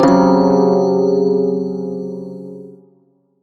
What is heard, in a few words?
Prime Shawzin Hirajoshi Chord, Music; Warframe